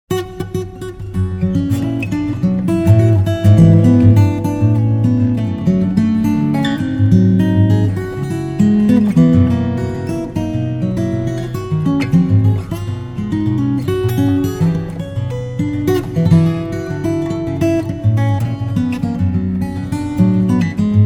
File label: French Samba